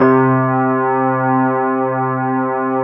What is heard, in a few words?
FENDER C2 L, Keyboards Old School; USB Soundscan vol.09; AKAI